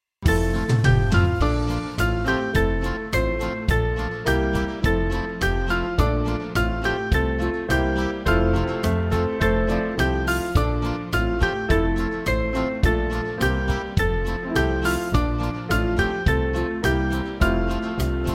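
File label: Band, Music Backing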